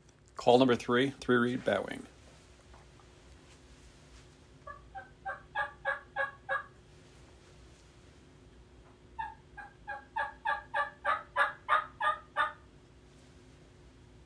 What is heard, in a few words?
2 Reed Batwing Mouth Call